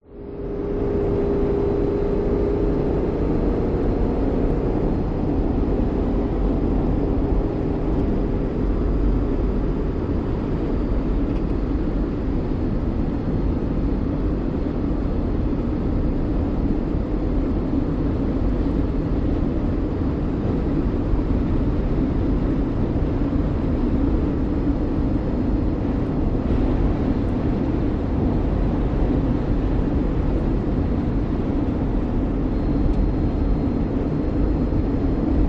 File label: vehicles car hyundai trajet drive motorway 110kph; 2006